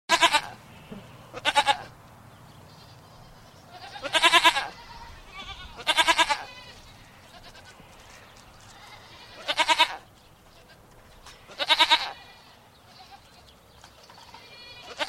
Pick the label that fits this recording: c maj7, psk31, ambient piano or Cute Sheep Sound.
Cute Sheep Sound